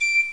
fluit d5

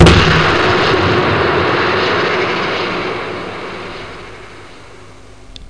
harde lange knal; The Amsterdam Marihuana Guide

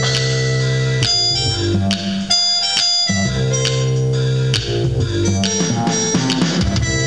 jazzje

